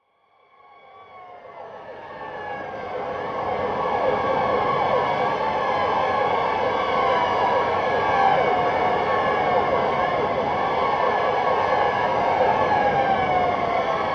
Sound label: in motion sinister police; sonniss gdc